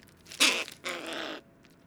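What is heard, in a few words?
hooded crow corvus cornix cornix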